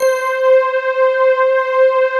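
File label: glass waves; JV PADS; Pro-Rec - Synthsonic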